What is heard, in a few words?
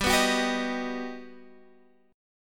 G Augmented Major 7th, Guitar, Standard; G+M7 strummed; JGuitar